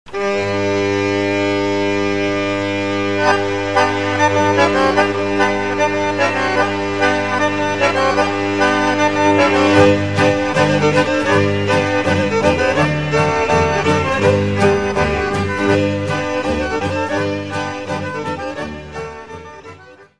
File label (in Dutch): Ijs Polka - Pays-Bas